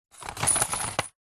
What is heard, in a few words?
foley money coins tip into paper envelope